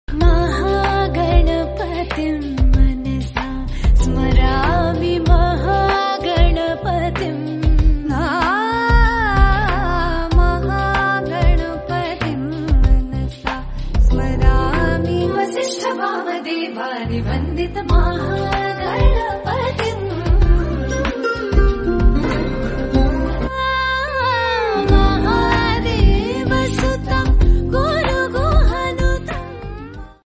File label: gana tamil song